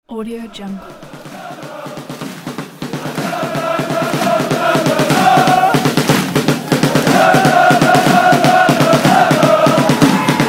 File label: stadium crowd sound effect